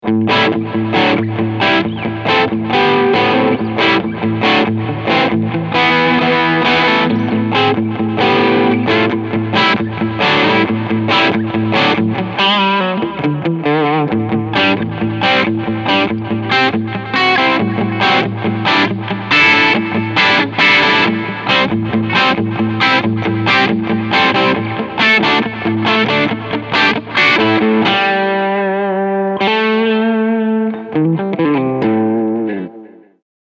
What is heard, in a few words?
IBZ Low Gain